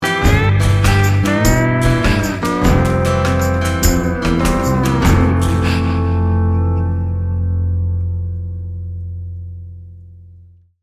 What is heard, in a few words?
river snake sting bumper